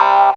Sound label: Trancers, Dance Synths; Trancers 1